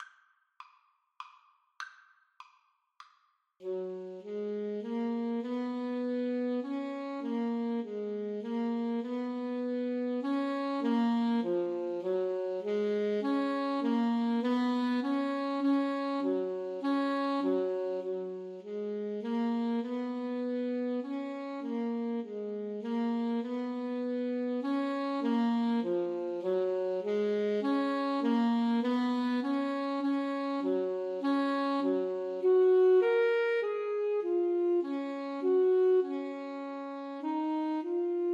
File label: Menuett in C L Mozart A SAX duet